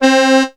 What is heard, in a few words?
Casio CZ-5000 Accordion C4